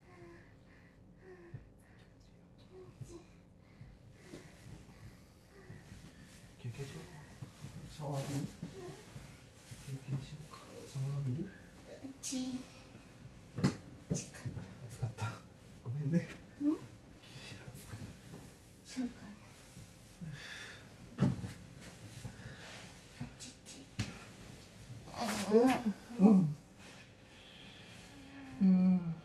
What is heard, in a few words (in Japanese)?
正常位中出し; Audio Denoise